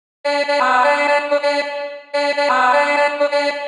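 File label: chopped vocals female B minor 128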